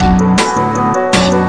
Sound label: 160 piano mood crisis full; SAMPLED MUSIC LOOPS